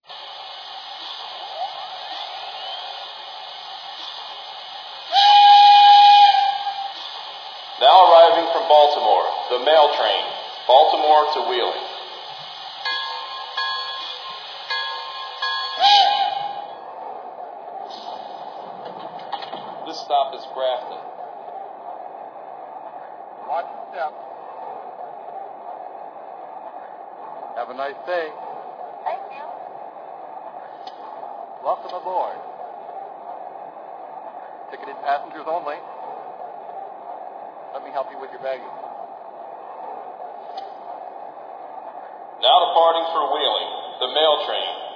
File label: ten wheel